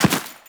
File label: run dirt, footsteps; Warframe; Teshin